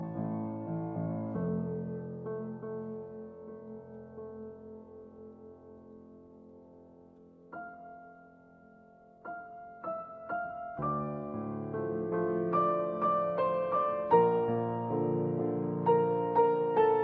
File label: Piano Sonata Finale; Sonata Brahmsiana